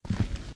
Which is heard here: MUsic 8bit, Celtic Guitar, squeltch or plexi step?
plexi step